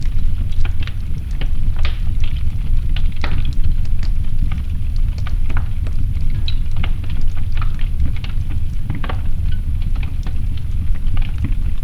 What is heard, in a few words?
wood fire; ambiances